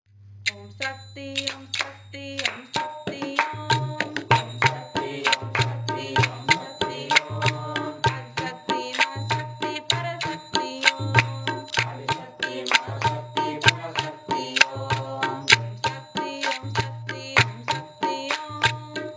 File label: Chorus Bhajan Song; Babaji; Om Sakthi